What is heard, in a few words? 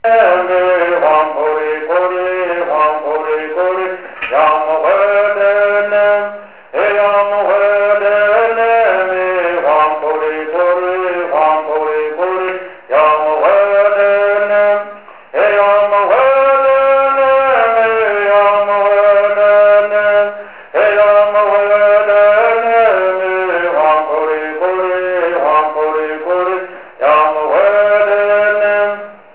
Bird